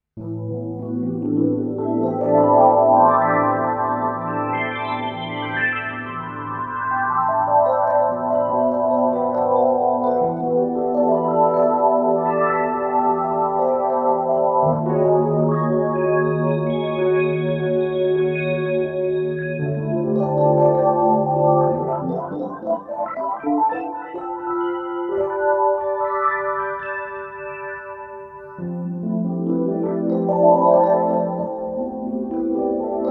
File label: rhodes; windows95